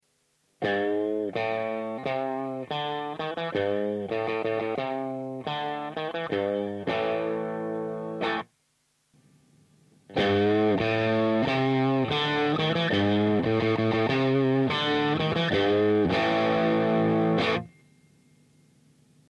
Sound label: bbp comp clean